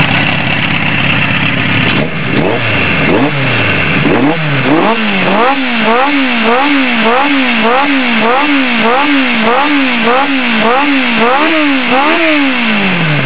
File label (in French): pot 1000R